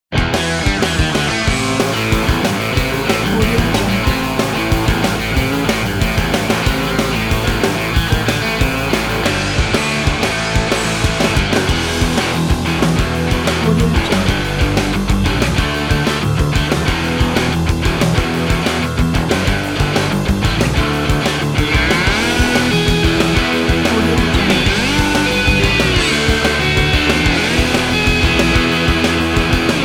classic rock country fun; duke studio

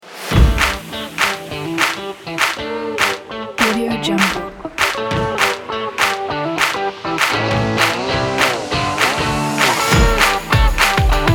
happy upbeat rock kit

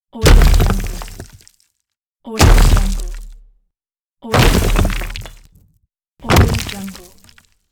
rock impact

